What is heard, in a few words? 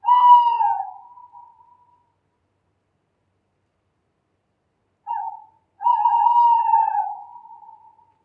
owl; DeadFright